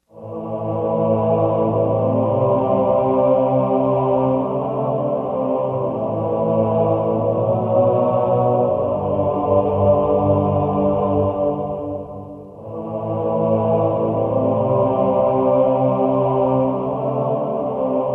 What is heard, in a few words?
amb; Empire Earth 2